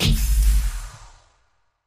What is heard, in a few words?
space lock door open, pneumatic